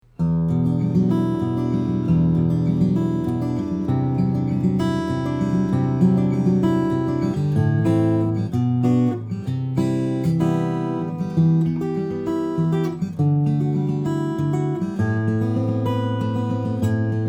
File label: steel string guitars, flattop; taylor gs8 grand symphony